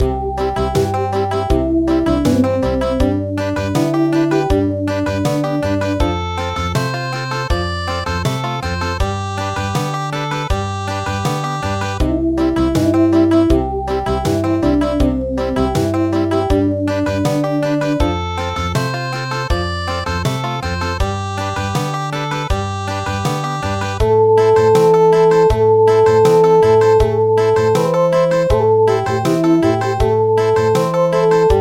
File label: Country, midi; Sasho